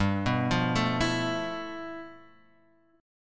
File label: G 13th G Guitar Drop D, chord